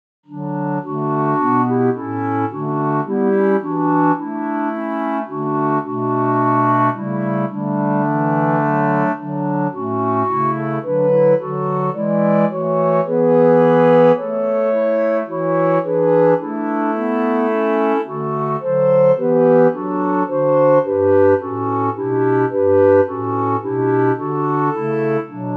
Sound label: robot clarinets; Braxted